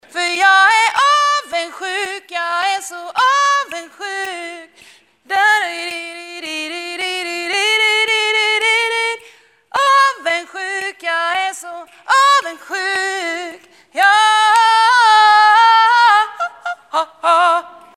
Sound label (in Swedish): sopran